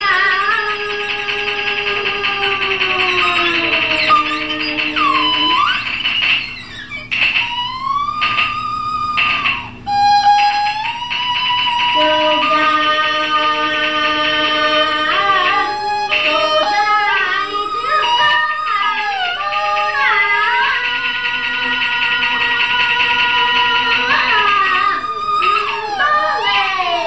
traditional ringtone

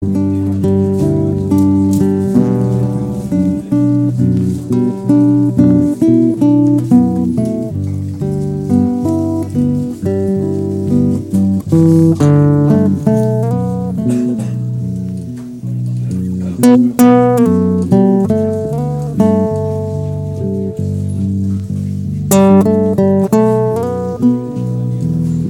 new brazilian despacho instrumental

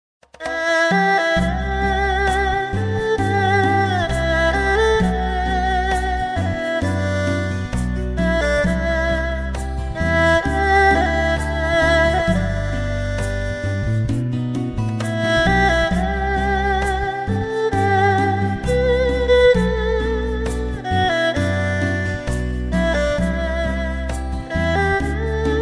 chinese violin

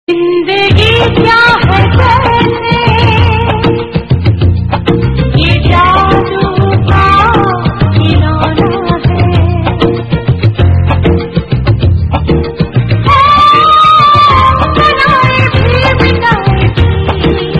love song, ringtone

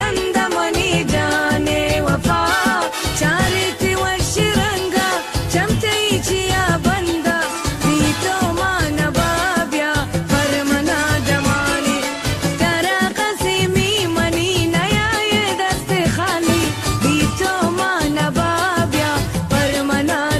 omani, ringtone

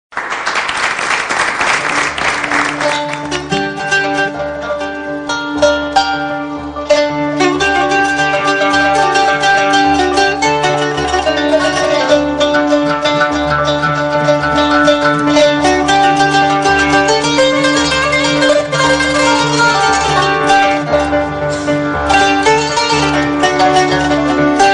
sephardic jewish ringtone

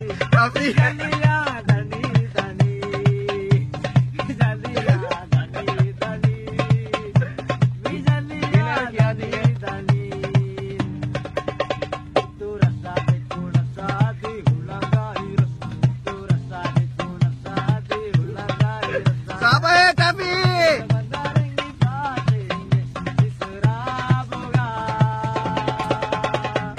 maldivian song ringtone

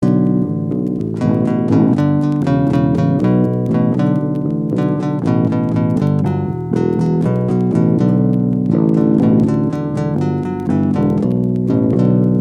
new guitar bomba

